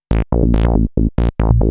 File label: TECHNO BASS 140 A